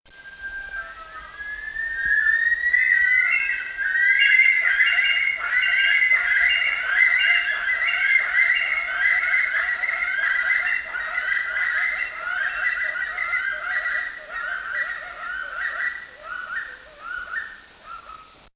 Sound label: bush stonecurlew